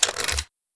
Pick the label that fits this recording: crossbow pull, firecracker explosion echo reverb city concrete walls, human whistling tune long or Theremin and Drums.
crossbow pull